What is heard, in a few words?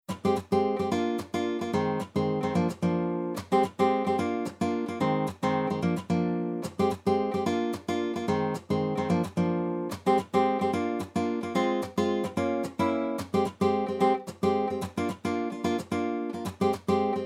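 Jazz Waltz Chord guitar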